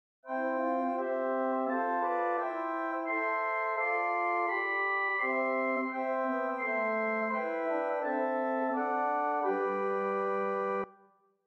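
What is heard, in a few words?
Swell Gemshorn, organ; Prudhoe Methodist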